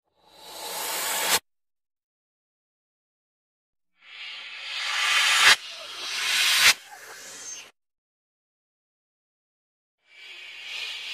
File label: vacuum